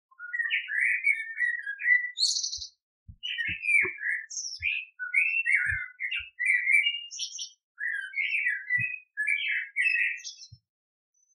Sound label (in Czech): kos černý